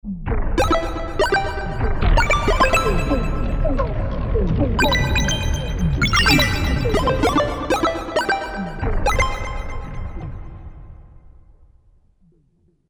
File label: Video Gm, Korg MSC-1S; Korg MSC-1S Variety